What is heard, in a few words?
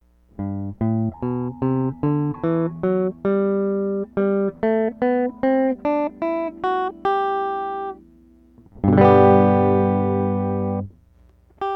Fulltone Supatrem Bypass G Major, guitars; Pedal Effects